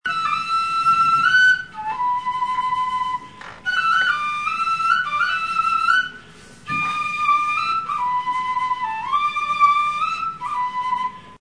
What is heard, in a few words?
kaval